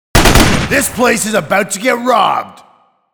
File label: Text message tone; Payday The Game; Dallas; Robbery